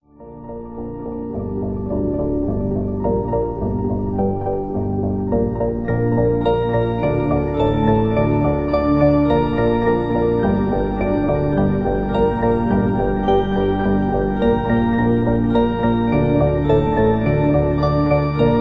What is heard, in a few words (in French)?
Orgue Electronique